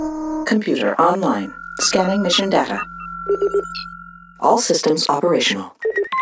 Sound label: startup; vacations; missionspace